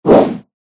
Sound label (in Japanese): ムチをふるう 通常攻撃 モノラル; 武器効果音